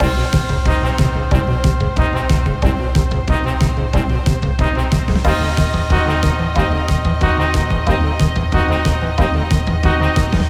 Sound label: Emergency; Firegirl: Hack 'n Splash Rescue DX